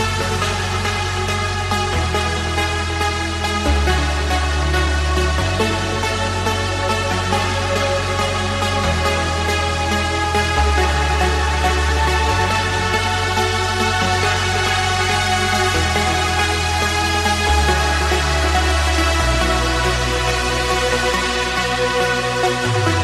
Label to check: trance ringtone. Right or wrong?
Right